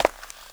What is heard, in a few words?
CONCRETE, footsteps; AKAI S-Series CD-ROM Sound Library VOL-6